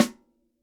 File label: short reverb snare drum a sharp key, snare one shot tuned to the A# note; snare drum samples